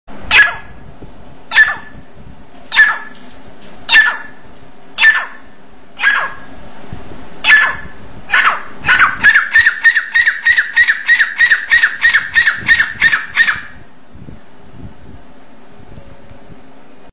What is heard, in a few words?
teetar bird sound